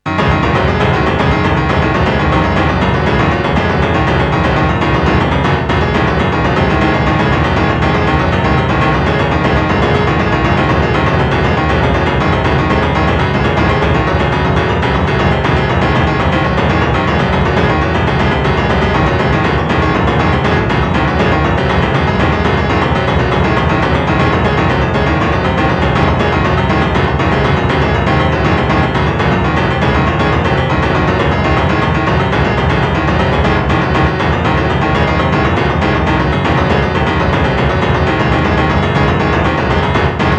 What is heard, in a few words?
piano